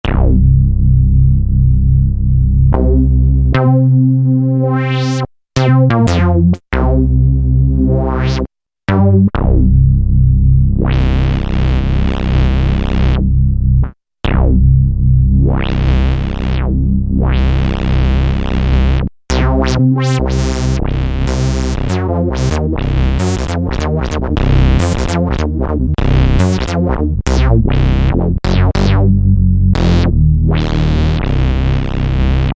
Acid Tripper, Roland SR-JV80-06 Dance

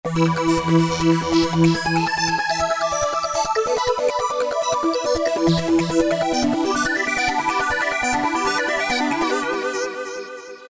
Tap Lead, Korg MS2000; Factory Patches

